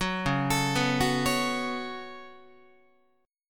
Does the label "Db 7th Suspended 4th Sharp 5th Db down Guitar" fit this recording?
Yes